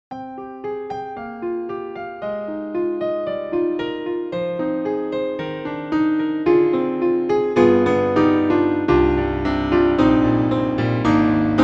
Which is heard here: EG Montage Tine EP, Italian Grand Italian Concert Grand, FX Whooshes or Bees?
Italian Grand Italian Concert Grand